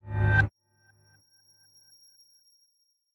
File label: melee attack impacts magical effects psychic